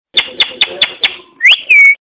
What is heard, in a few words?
parrot message